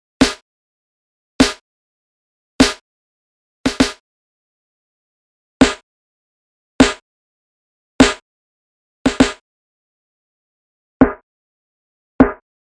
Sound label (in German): Snare 1982 Variationen; Nektar Aura